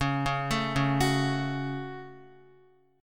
C sharp Suspended 4th Sharp 5th, Guitar; C#sus4#5 arpeggiated; x,4,1,2,1,2

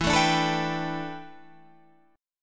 A 9th Suspended 4th E

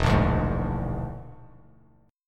E 7th Flat 5th, down; E7b5 strummed; JGuitar

